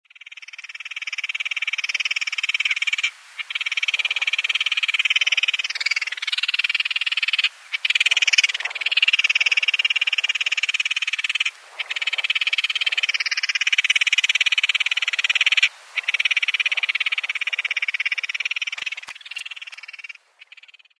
phaethon rubricauda; młody ptak w gnieździe proszący o pokarm; ptaki

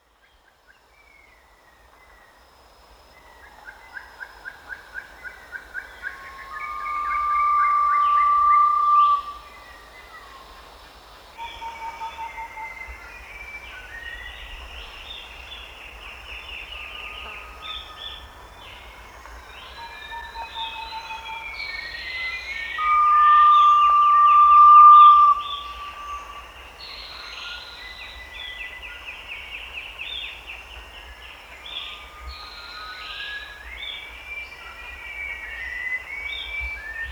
erythropitta granatina; Kurtaczek granatowy; ptaki

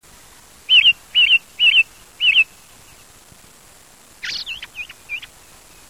Turdus torquatus, turdidae; drozd obrożny; wroblowe; Przylatuje do nas w marcu-kwietniu, a odlatuje we wrześniu-listopadzie. Drozd obrożny jest ptakiem chronionym.

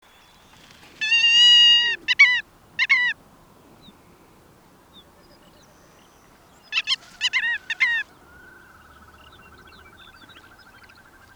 limosa lapponica, Przykłady głosów szlamnika; Szlamnik zwyczajny, szlamnik rdzawy; siewkowych (Charadriiformes)

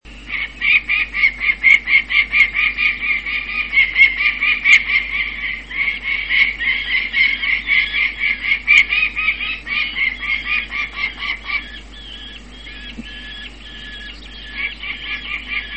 falco peregrinus, sokół wędrowny

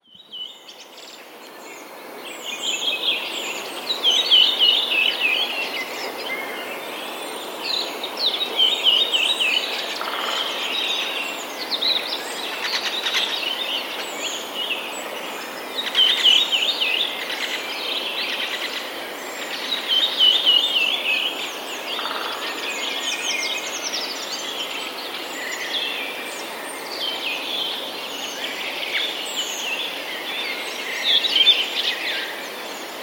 turdus iliacus

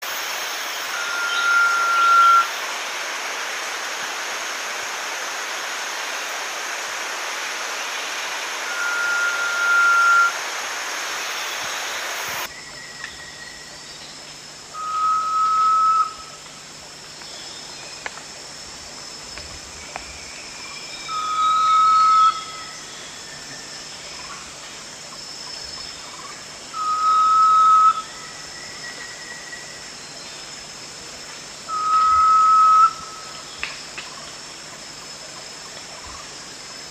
erythropitta arquata; kurtaczek modropręgi

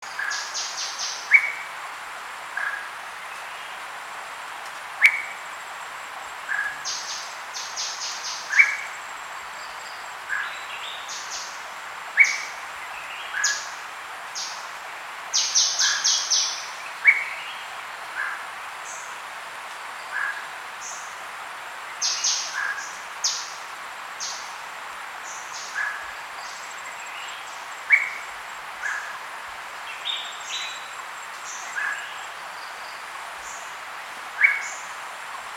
hydrornis gurneyi; kurtaczków czarnobrzuchych; ptaki

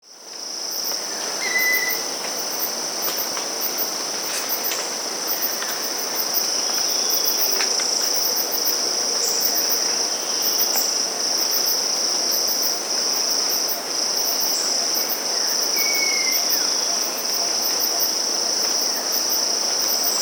sarcophanops steerii; szerokodzioba filipińskiego; nawoływań; ptaki; Oto przykład nawoływań szerokodzioba filipińskiego

